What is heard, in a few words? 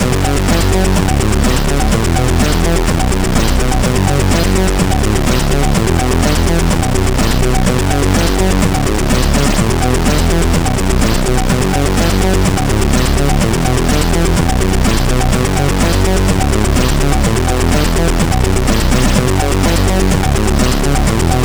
CSG8580R5